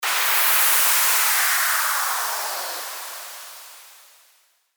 WHOOSH

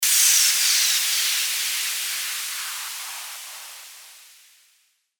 WHOOSH

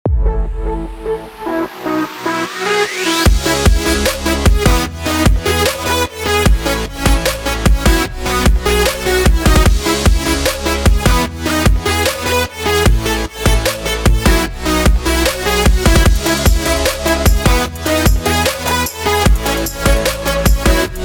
BED FUTURE BASS C#MIN 150BPM; canfximaging

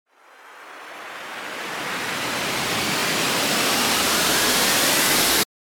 RISER

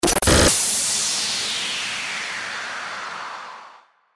STARTER WHOOSH

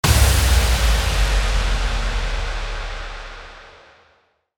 IMPACT

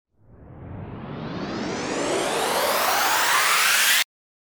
RISER

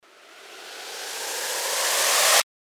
RISER

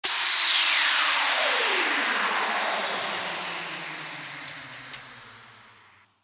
WHOOSH

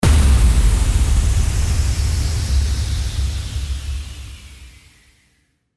IMPACT

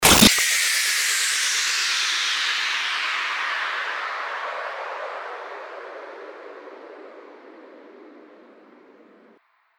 STARTER WHOOSH

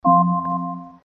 Amazon Echo setup mode on

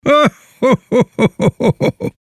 SANTA LAUGH

